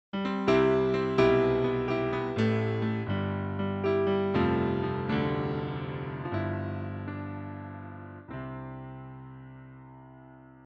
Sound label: jingle